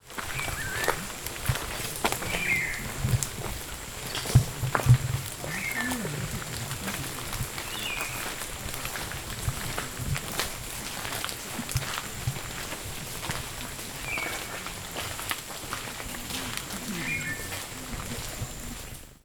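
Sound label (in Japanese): ミナミトラツグミ; オオトラツグミ; さえずり; ツグミ科; 鹿児島県奄美市名瀬朝戸金作原; bird song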